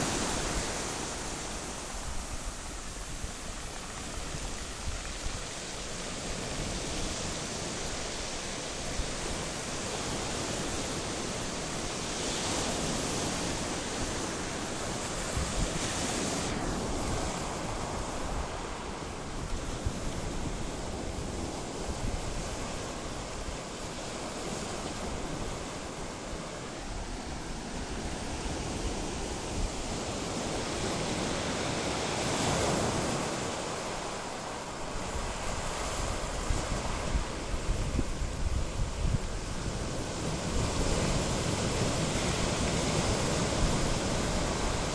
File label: sea sounds